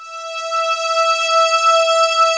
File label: SWEEP E5, RHODES CHROM; Greytsounds VOL-1